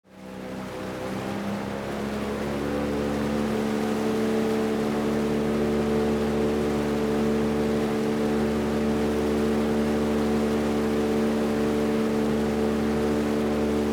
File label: Motorboat ambience moving at sea loopable